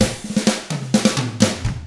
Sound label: 127 SURF ROCK